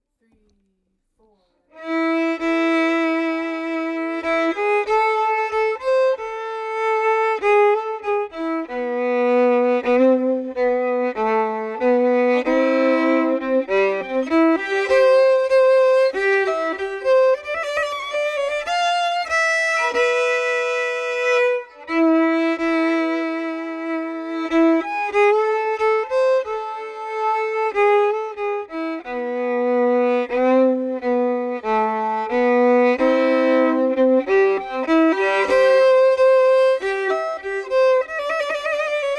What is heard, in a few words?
andakten harmony, fiddle